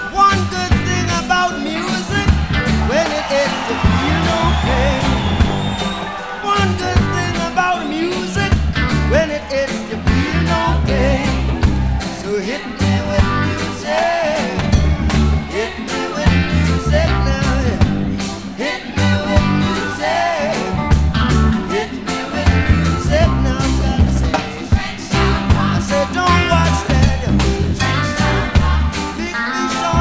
reggae, music genre

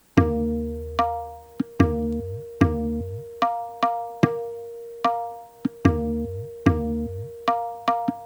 Jhaptal, Tabla; Tabla-Transcriber

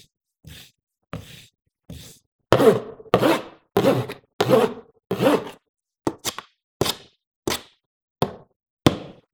cardboard

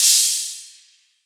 cymbal

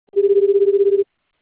bell; ja-JP

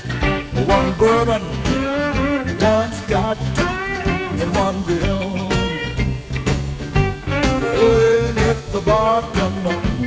musicgen out melody

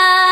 Acapella ver. Loop

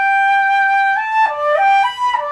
flute; blocks neural instrument coding

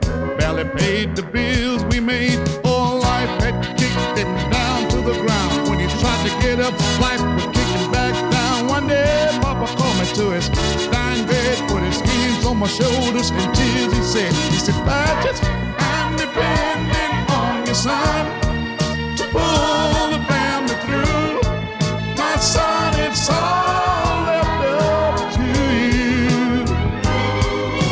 disco, music genre

